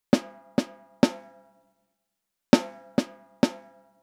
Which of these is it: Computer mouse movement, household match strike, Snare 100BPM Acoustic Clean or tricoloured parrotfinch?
Snare 100BPM Acoustic Clean